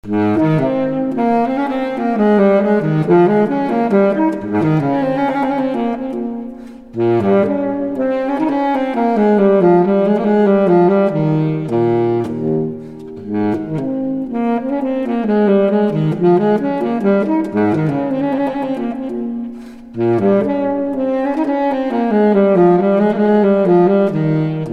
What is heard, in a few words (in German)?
menuett baritonsaxophon